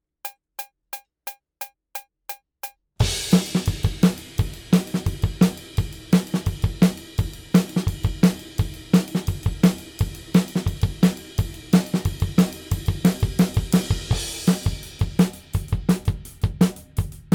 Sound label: Drums ONLY; Pre Pro